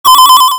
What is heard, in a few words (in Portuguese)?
Alerta, toque de celular